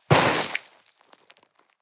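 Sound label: step gravel land hard, footsteps; schritte; menschen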